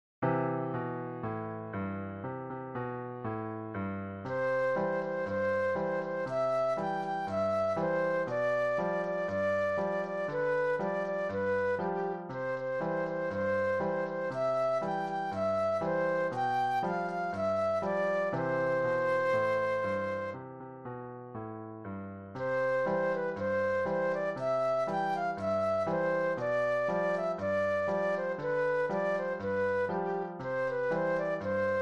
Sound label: flute solo